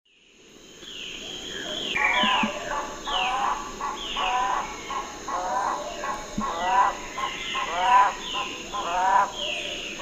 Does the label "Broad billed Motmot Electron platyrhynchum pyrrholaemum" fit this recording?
Yes